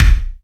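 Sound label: BD reverb, Yamaha RY-10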